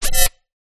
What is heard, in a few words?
science fiction robot servo motor short movement